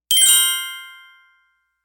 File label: Twinkle; gekiban